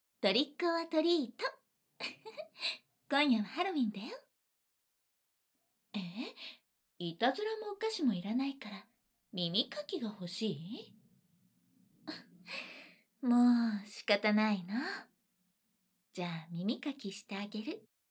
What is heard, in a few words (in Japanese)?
ハロウィン耳かき, ASMR; ハロウィン耳かき2017